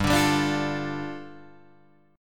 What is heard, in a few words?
G 7th Suspended 2nd, Guitar DADGAD